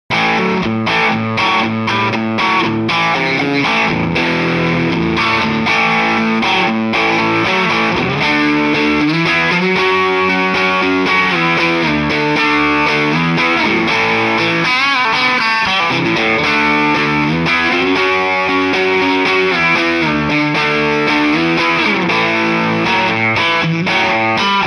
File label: Pwer Rhythm Tele